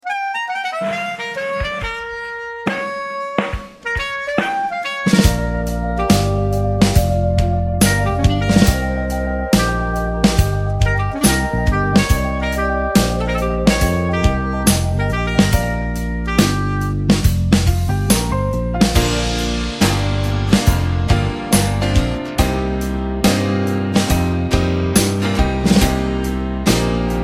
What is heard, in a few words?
Bb No Backing Vocals, backing tracks